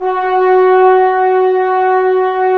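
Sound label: brass trombones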